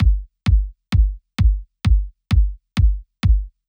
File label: kick Electro